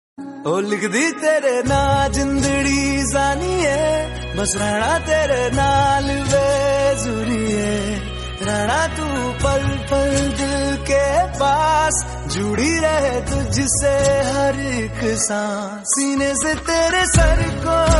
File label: hindi romantic song